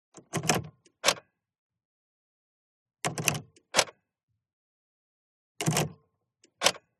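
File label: SPORTS CAR Interior Key Pull Out; TRANSPORTATION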